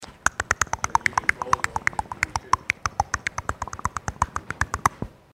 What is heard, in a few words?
mouth clicking